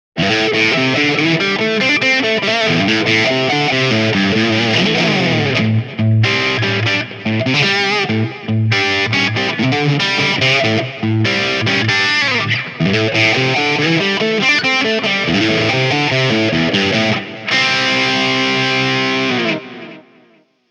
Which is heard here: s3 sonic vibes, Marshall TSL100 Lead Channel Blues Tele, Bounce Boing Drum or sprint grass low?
Marshall TSL100 Lead Channel Blues Tele